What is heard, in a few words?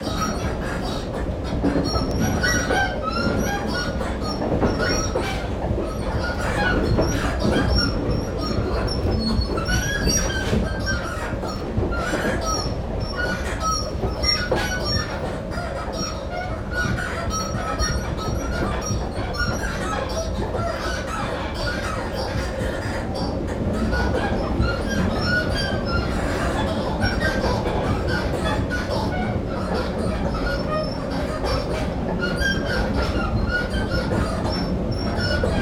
empty rer b sounds like crying dogs